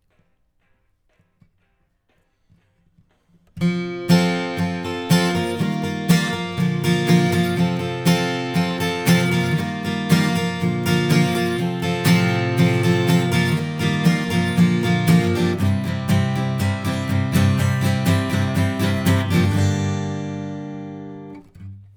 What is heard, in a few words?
Ac GTR